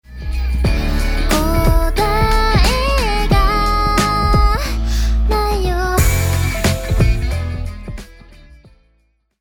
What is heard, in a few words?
delay Reverb